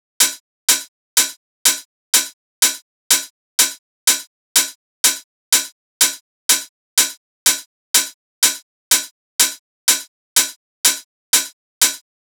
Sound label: Drum Loop - 124 BPM Hihat; Loop Stems; Cymatics; Anubis Drum Loop 2